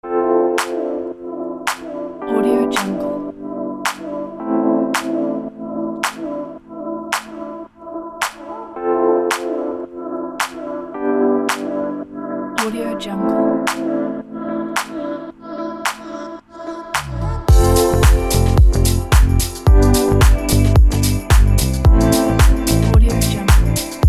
upbeat fashion lounge

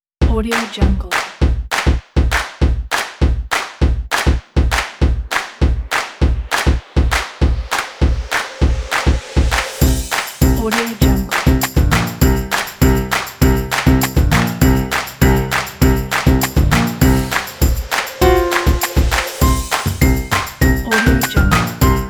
claps jazz

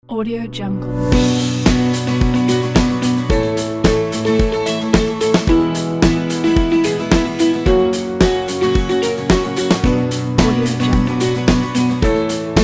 ukrainian folk corporate

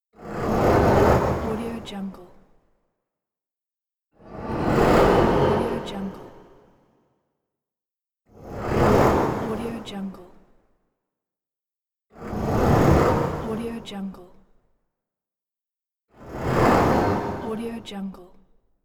unguided spaceship flybys

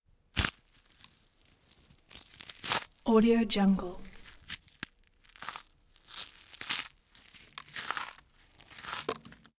cookie crunch vanilla wafer and oreo handling

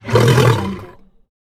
tiger

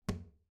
object plastic trash can close soft; smartsoundfx

